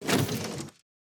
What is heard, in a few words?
panda open; battle